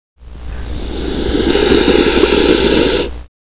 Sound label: hiss amplified, snake